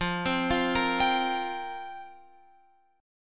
F Suspended 2nd Suspended 4th F down Guitar Open F; Fsus2sus4; arpeggiated